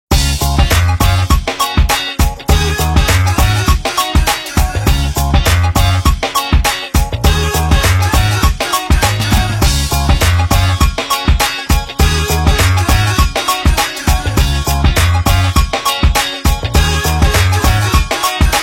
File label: Skittle, phonetones Nokia